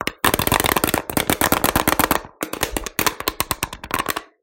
Tin Can, Foley; TRUTH-HZ; Crowdsourced Sample Pack

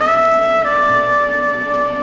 sax; TRUTH-HZ; Crowdsourced Sample Pack; Brass & Wind; PSY sax

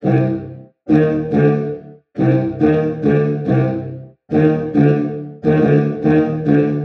BRASS 140BPM; Melody Loops; Crowdsourced Sample Pack; TRUTH-HZ

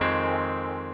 warm, Piano & Keys; Crowdsourced Sample Pack

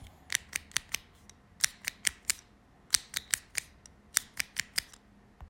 Perc loop; Foley; Crowdsourced Sample Pack; TRUTH-HZ

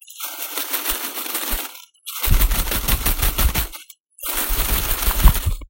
Plastic Flowes, Foley; TRUTH-HZ; Crowdsourced Sample Pack

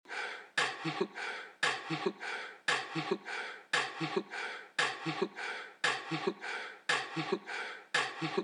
fx vox loop; Sound FX; Crowdsourced Sample Pack

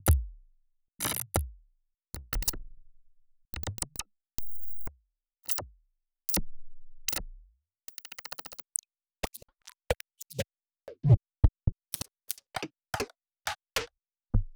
squeaks bonks plonks, Digital FX; Crowdsourced Sample Pack

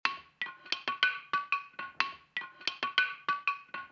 PERC LOOP; Crowdsourced Sample Pack; TRUTH-HZ; Drum Kit; Percussion

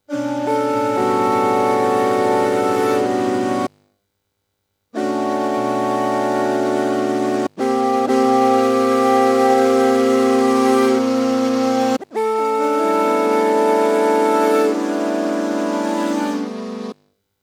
omnisphere chords; Crowdsourced Sample Pack; Crowdsourced Live Stems

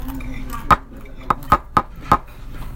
Eb Wong Knock, Foley; Crowdsourced Sample Pack; TRUTH-HZ

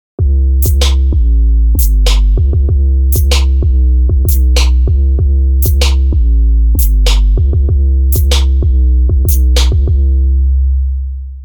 DRUM 96BPM; Crowdsourced Sample Pack; Drum Kit; Drum Loops

